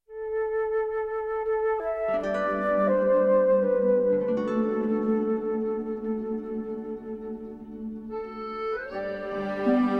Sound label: Dawn waltz